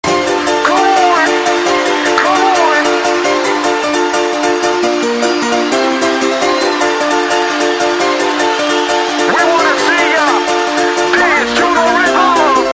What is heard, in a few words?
bbox